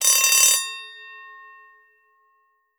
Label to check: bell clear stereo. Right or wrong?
Right